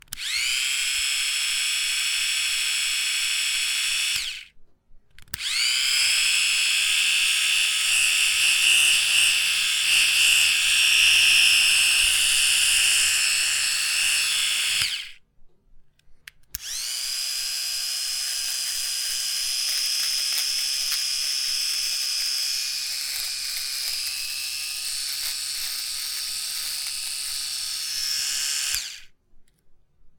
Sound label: nose hair cutter